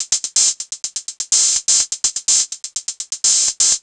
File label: drum loop noclap hat 125, Classic Chicago House; Sample Magic; Drum Loops